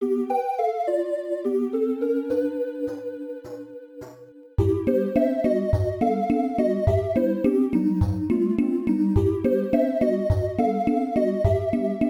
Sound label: Underwater; SMB3